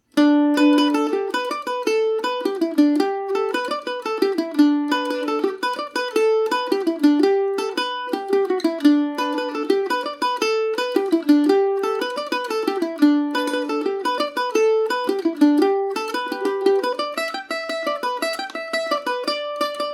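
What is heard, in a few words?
G major paris swing, irish mandolin; Paris Swing Macaferri mandolin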